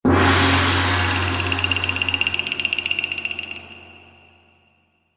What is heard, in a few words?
Loop Accent